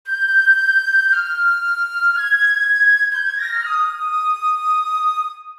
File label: SFX Wood Wind Melo